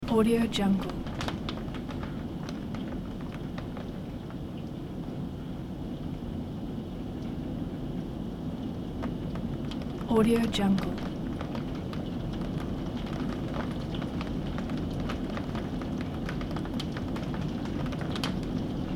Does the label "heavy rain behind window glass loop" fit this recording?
Yes